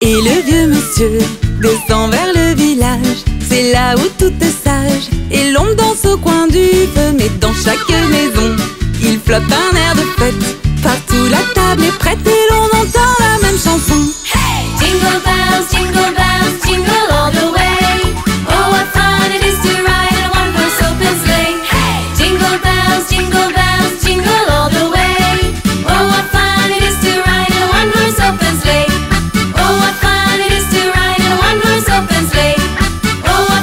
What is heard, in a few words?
jingle bells